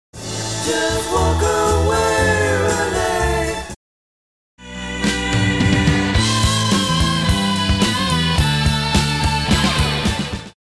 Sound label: chorus start vs guitar lick